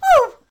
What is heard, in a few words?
ouch; dolls House